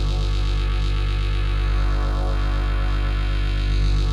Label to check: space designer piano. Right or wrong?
Wrong